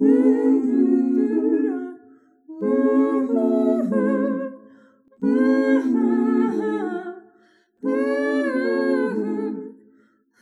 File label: Soft Soulfull Harmony 4 bar mix